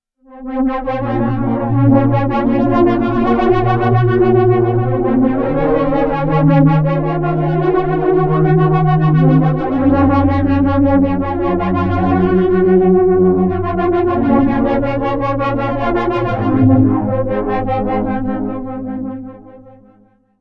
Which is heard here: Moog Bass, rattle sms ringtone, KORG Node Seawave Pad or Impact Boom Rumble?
KORG Node Seawave Pad